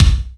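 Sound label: BD reverb, Yamaha RY-10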